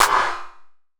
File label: Clap, Gated Reverb; musicradar